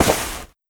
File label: Cloth; Scum